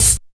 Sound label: HAT; East West - Phat & Phunky CD2; 90's Sample CDs